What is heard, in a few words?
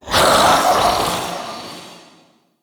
Male Vocal Attack Heavy, Enemy; Mockery; Mockeries